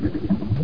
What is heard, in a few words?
bubble